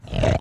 Monster Snort

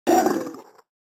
trident pain

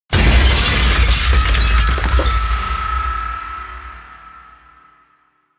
Storm Window Smash

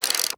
line press

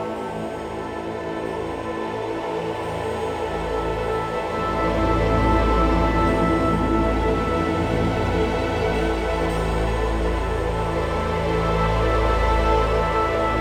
GodShrine Loop; Jotun

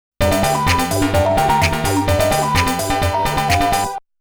Game Start Music; Namco Classic Collection Vol.2; arcade soundtrack

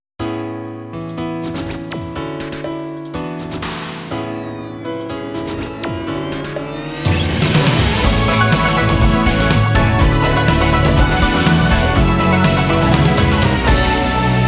off vocal; Memories Off After Rain; Ribbon

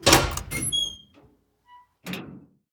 metal door open; SFX

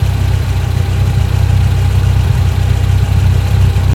Vaz Priora Idle

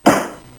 Stamp

